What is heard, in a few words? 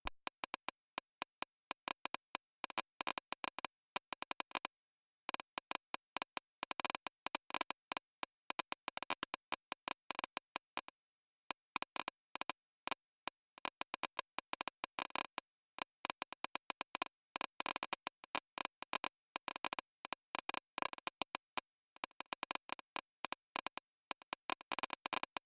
Geiger Counter Dry